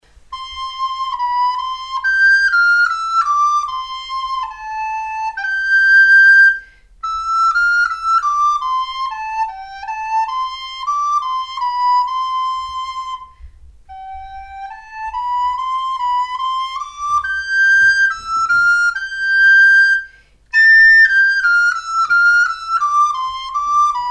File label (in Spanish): flauta